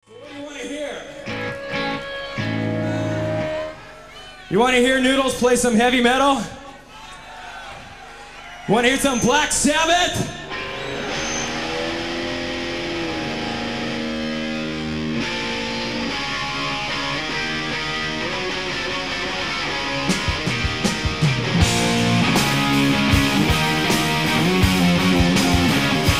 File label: Heavy Metal, live